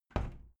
window plastic squeak open soft

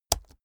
keyboard laptop space press slow hard

